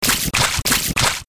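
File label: water kick; mizukeri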